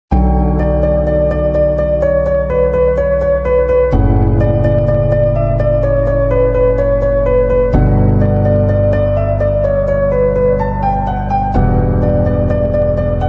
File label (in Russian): частушка hardstyle remix slowed